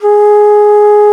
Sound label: Tenor Bansuri G#3; Multisamples; Digital Sound Factory Planet Earth